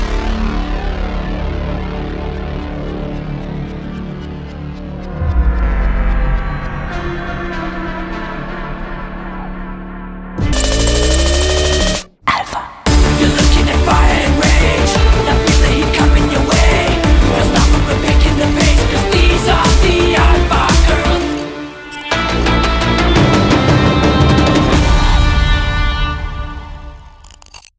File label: Instrumental